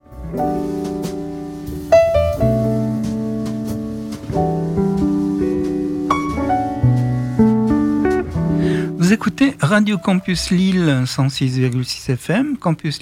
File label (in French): Jingle Radio franco chinois